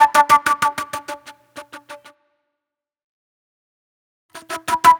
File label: Pop Flute